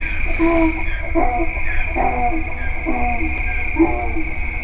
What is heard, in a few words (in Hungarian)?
távolról vadászlesről, magyar parlagi szamár; allathangok; termeszetben; kozepcsala